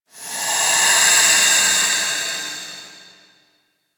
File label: Infested Distant Breath, Ambience; Warframe; Corpus Infested